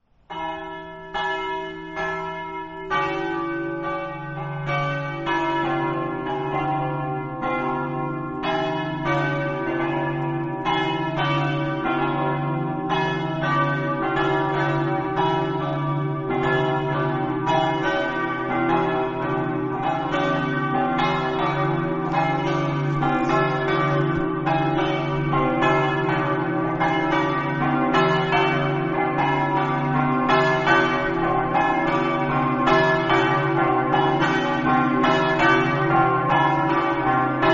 enemonzo, campane

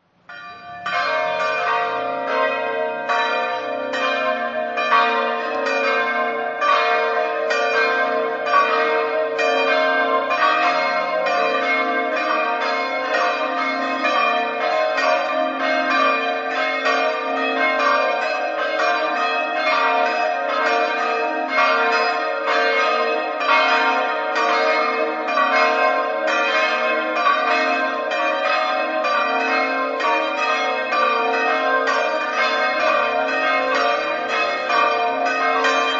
rmistica, campane

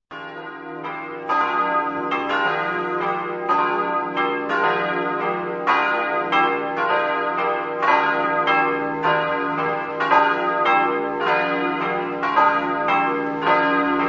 orzano, campane

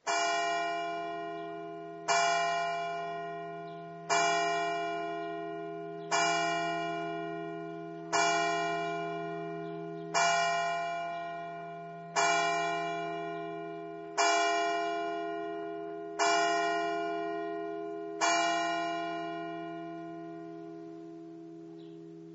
bueriis, campane

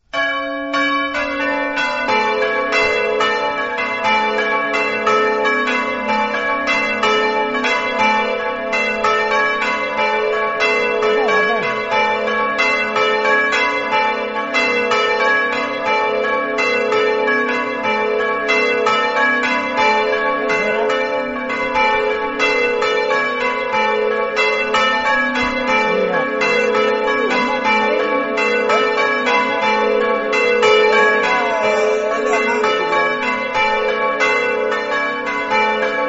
paderno, campane

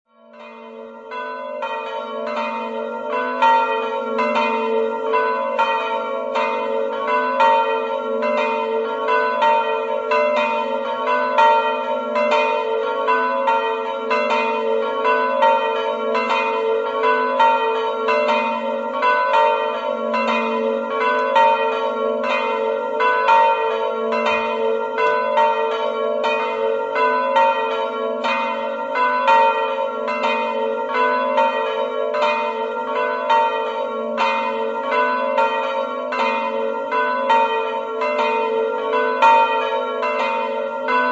zeglianutto, campane

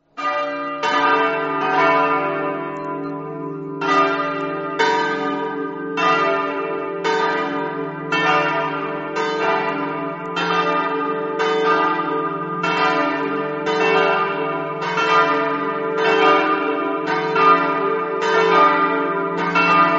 campane, Rosazzo

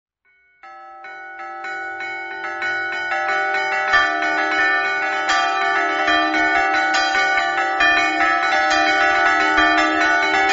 aveacco, campane

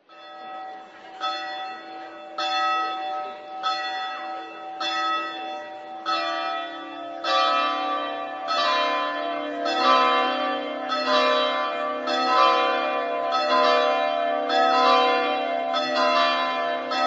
mossa, campane

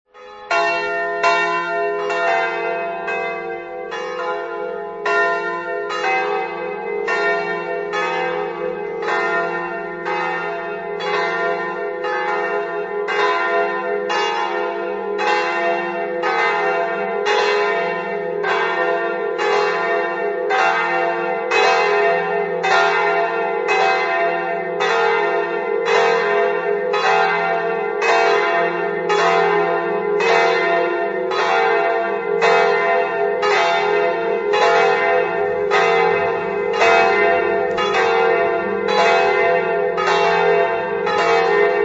carlino, campane

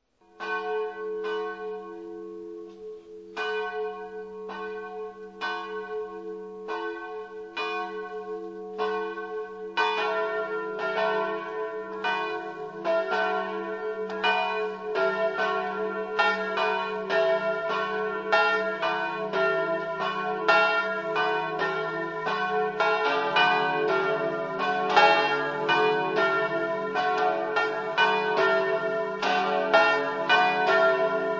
paularo, campane

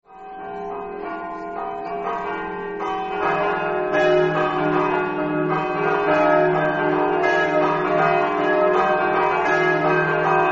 castelmonte, campane

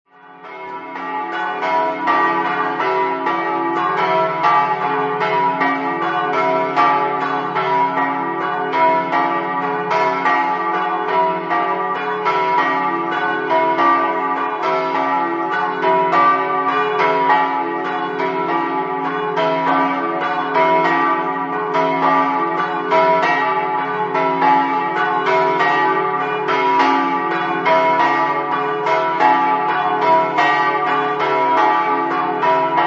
prata, campane

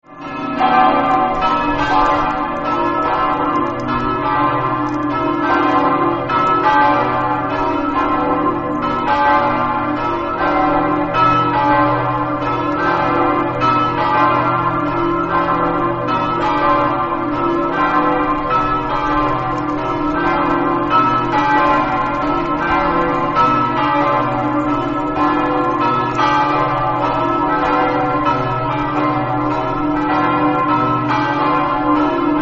campane, Aquileia

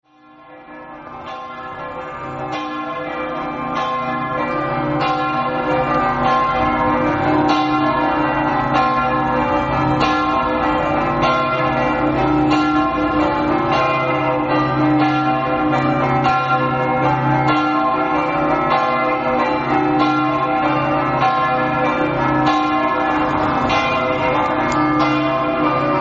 rivignano, campane

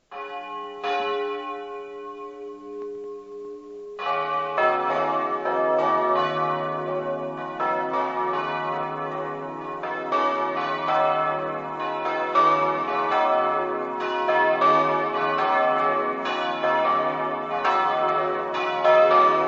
moimacco, campane

